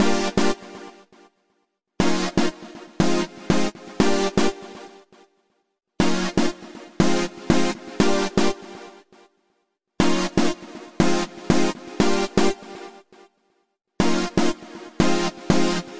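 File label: euphoric synth stabs, 120 bpm; SYNTH AND ELECTRONIC LOOPS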